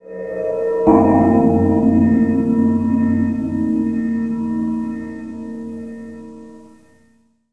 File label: gong